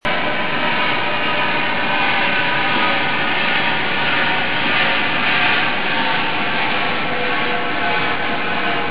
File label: liquid nitrogen; RadboudUniversityNijmegen